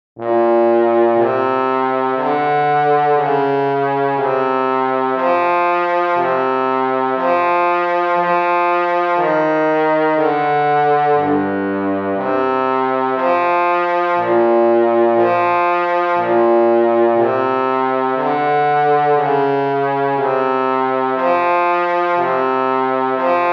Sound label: trombone